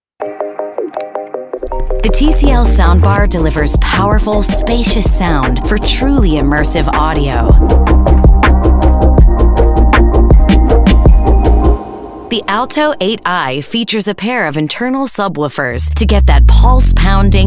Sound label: Soundbar POP; TCL Soundbar 8i